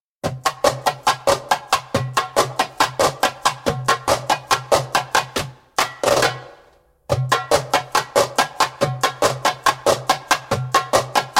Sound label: Smooth Transitions (slow), Djembolale; Djembolale - Fills and Drills